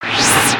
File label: super jump begin